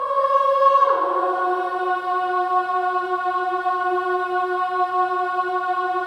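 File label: Voices, INTERVALS, 5TH DOWN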